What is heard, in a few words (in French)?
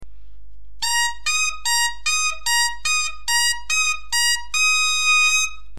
si mi octave, bombarde; Partir du si aigu pour aller jusqu'au mi